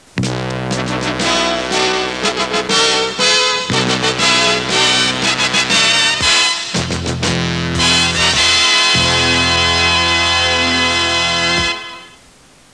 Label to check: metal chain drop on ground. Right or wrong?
Wrong